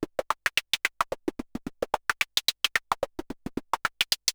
MiniBrute 110BPM, Gated Seq Noise

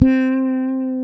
Fretless Bass

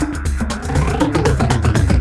Loop, Roland; Sound & Vision - Gigapack I

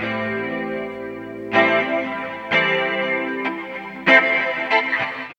GUIT; East West - Phat & Phunky

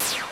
HIP HOP; Rubber Chicken Software Sample Bank

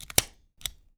STAPLER; AKAI S-Series CD-ROM Sound Library VOL-6

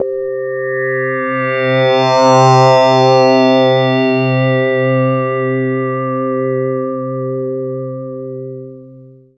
SYN SYN, Roland; Sound & Vision - Gigapack I CD 2

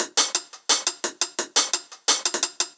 PRCLP, Percussion Loops; neuro; Stanza; Drums

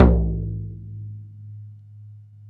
DRM HYBRID, Africa; Roland LCDP14 Africa VOL-2; Afro Menu 1

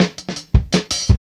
Skippy C Cut, Drum Loops, Jungle, 165.5

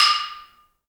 balambo, balafon; Heart of Africa

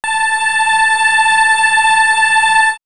Swirls Time Bell, pads; X-Static Goldmine

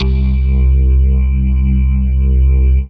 Single Electric Basses, Dance Floor Bass

